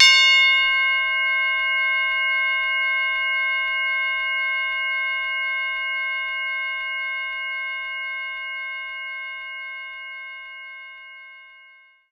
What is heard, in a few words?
Tubular Bells C4